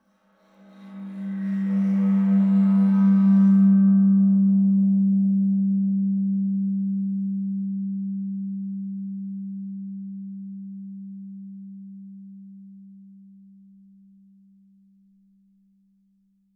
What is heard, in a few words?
tamtam bow stereo, Percussion; MIS Pitches - 2014; Tamtams